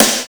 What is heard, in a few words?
SLAMMER SN; BONUS SN2